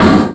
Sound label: All Explosion